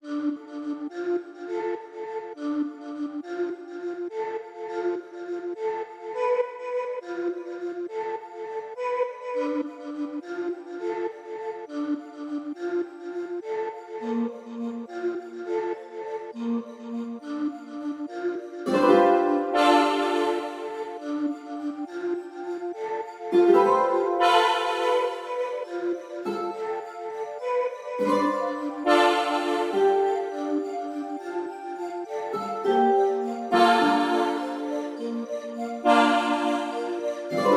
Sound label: boat music loop; fujii ps4 windows gamerip 2019